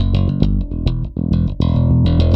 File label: Electric Bass; Basses